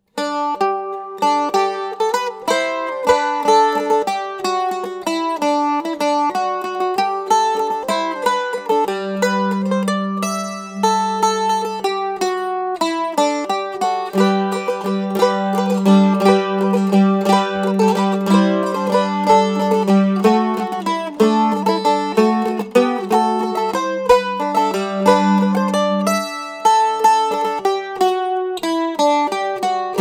mandolin

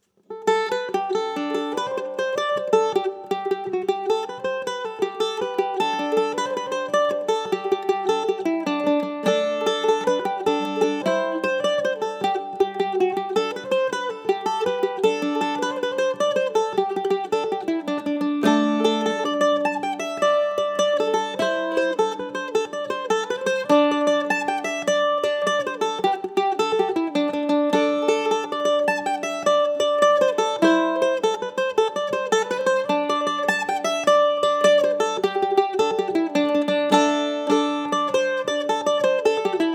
woods, mandolin

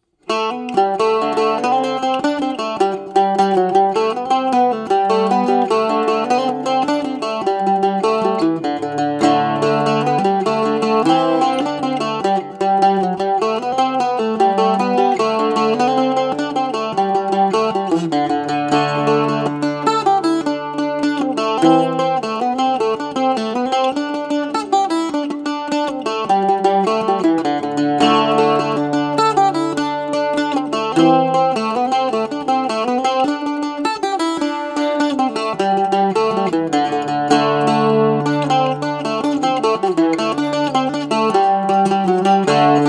woods, mandolin

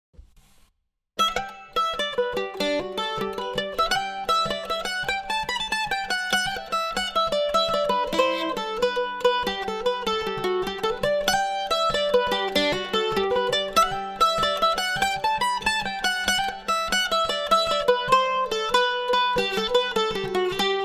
mandolin

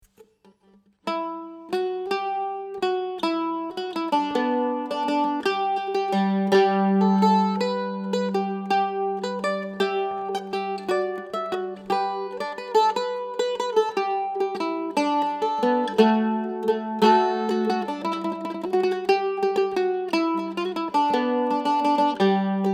walts, mandolin

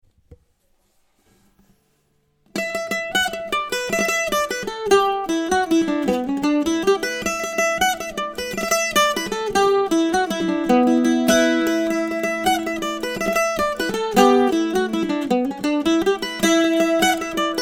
mandola

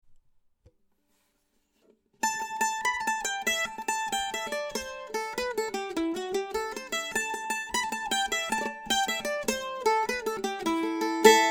mandolin

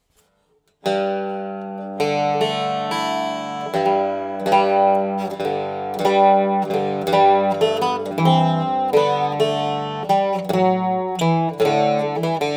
octave mandolin

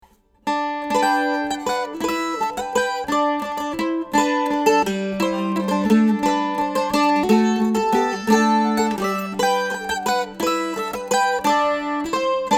mando

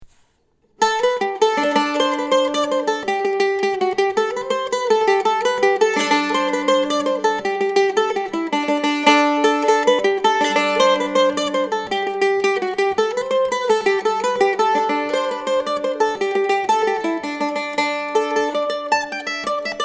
mandolin

